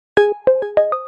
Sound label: xiaomi 12 lite notification ringtone